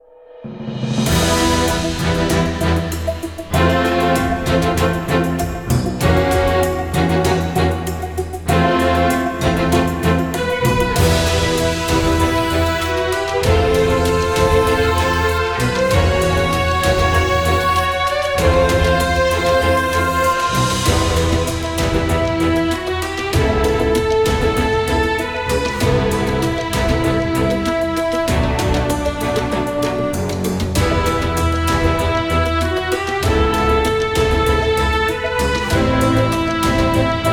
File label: Bgm dungeon main; chronos empire